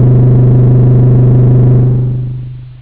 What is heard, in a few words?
analog, synth